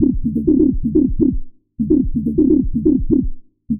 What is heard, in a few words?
126 D MUSIC; funky; Loopmasters; Funky Underground Vol 2